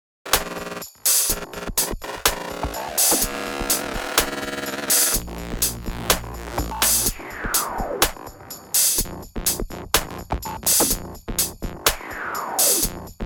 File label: dubstep remix set